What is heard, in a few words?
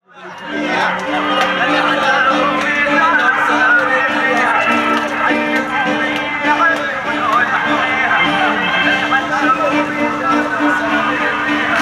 Ambiance CELLO